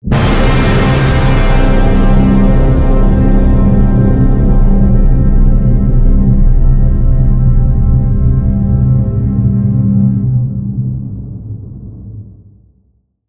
Orchestra Hit

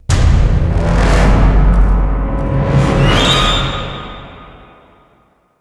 Orchestra Hit

